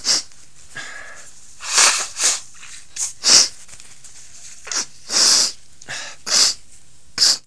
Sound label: sniffles